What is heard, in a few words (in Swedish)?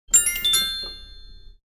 klockspel sms